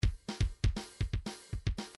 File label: midi grooves rock